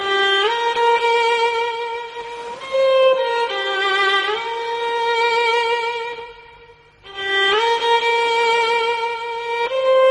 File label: Violin